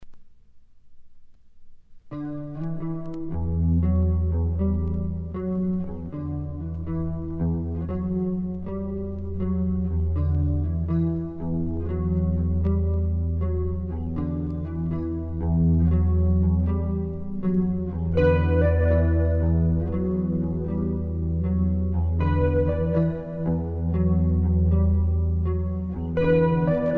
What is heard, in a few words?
Rain song